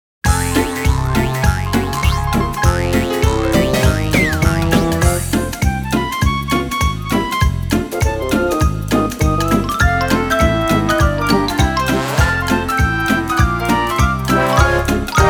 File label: music; pooh; tigger trot